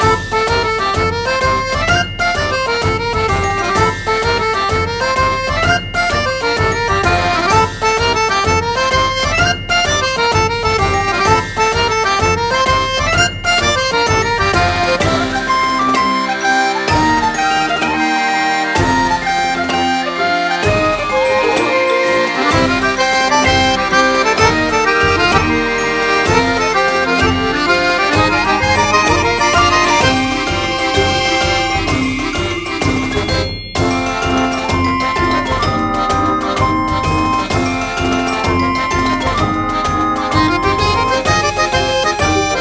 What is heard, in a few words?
March loop